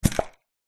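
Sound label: bar shaker sounds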